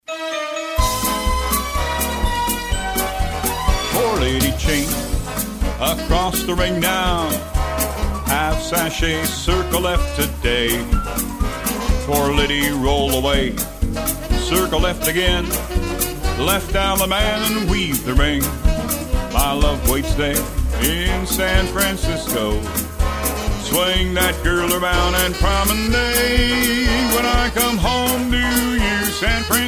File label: vocal